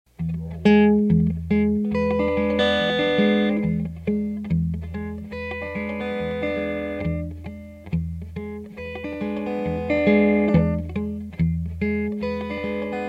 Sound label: Strat Neck Pickup Vibrato Channel